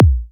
Kick

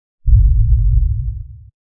Background Cannon Shot

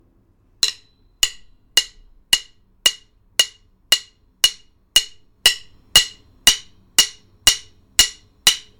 Drumsticks Tapping Together

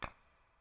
Rocks

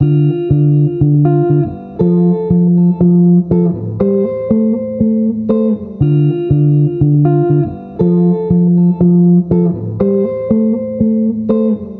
Guitar

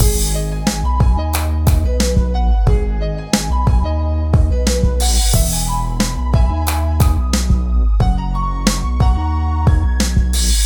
Hip Hop Sample

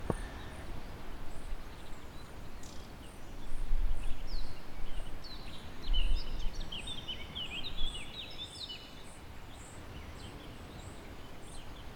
Nature In Cala Fuili

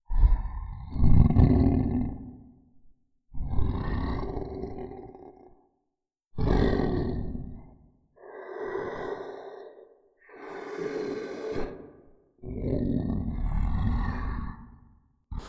Monster Growls And Grunt

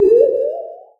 Alien Space Sound

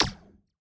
Blaster outgoing

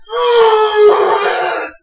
"Uuuurrrgh"

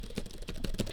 Keyboard

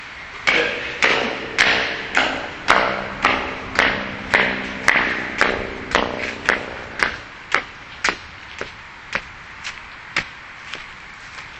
Marching Footsteps

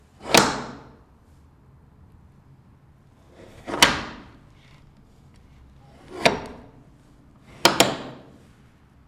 Various Door Bolt

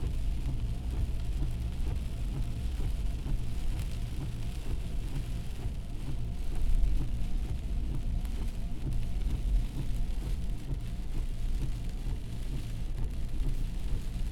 Soft Rain With Fast Windshield Wipers

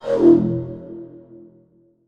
Pipe Flute Reverb Pitch

